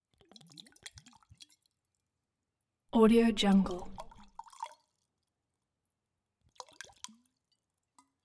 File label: vodka bottle pour